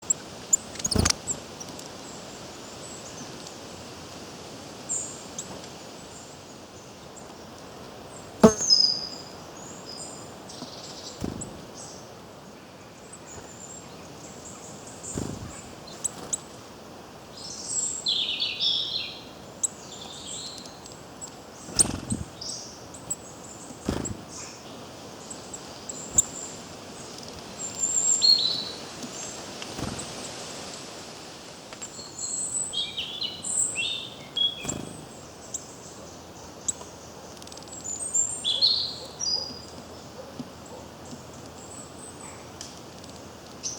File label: bird sounds birds chirping; for cats to watch and listen to